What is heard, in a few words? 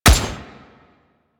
Gunblade Automatic Shot, Weapons